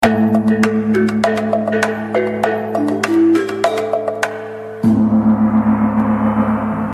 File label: Suspense